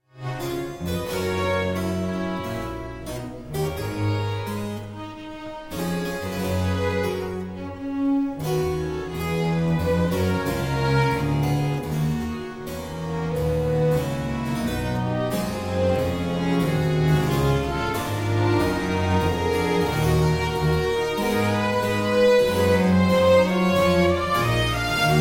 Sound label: harpsichord concerto in E minor; wilhelm friedemann bach